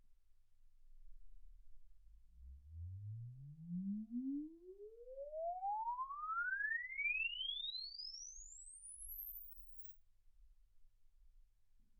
exp swept sinus 10Hz 24kHz

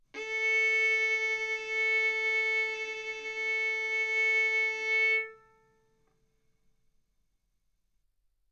Violin arco A4 B4; mf; sul A; stereo

